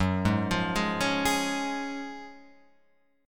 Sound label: F sharp Major 7th Suspended 2nd, F sharp, down, Guitar, Open F; arpeggiated; JGuitar